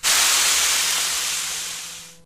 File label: imp bullet energy default wash; Titanfall 2